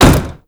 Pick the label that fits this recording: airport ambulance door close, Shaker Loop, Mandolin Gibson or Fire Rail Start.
airport ambulance door close